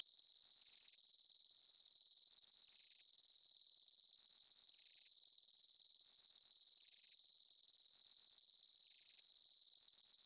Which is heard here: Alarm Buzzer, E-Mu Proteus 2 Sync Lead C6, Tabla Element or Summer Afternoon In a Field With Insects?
Summer Afternoon In a Field With Insects